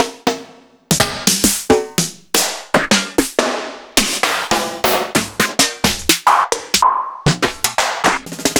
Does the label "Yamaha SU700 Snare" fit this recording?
Yes